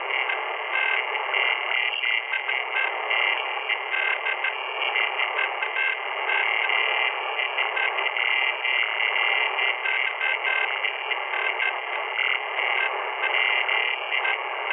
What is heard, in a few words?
Radio Interference Artificial